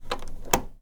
Key lock; Phasmophobia

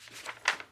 Page turn; Phasmophobia

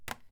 Plastic Impact; Phasmophobia

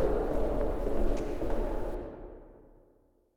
Steps; Phasmophobia

